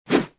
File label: swing, melee; weapons